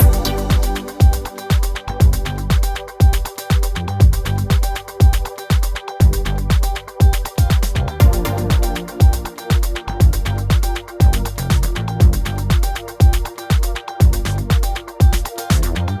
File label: Wild music; netent; dazzle